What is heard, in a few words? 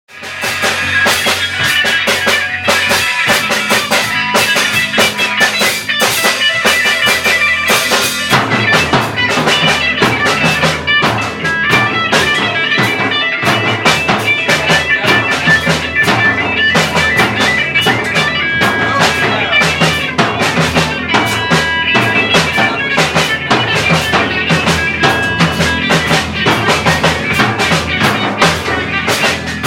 Boom Boom Thump Boom